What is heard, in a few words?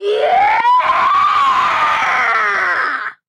boomboom scream; goon; Blind Drive